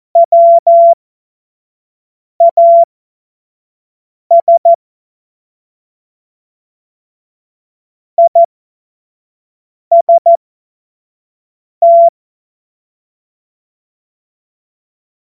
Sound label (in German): Witz, Morsekurs, cw morsen